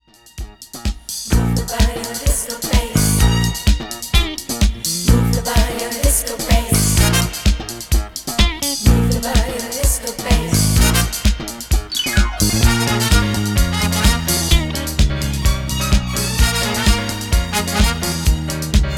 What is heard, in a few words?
disco bass